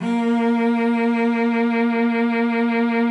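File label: A#3, cello vibrato; Xsample VOL-7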